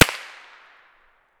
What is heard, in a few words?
tt fire outdoor far; Escape from Tarkov